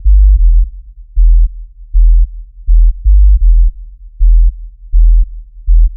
Bass; Sonic Foundry (Sony Creative Software); Mac Money; Basses

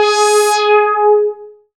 SYN Resotron; Best Service Gigapack 1

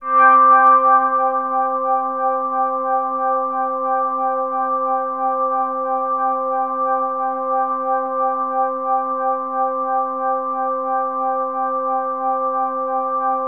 Access Virus B; Synthworx

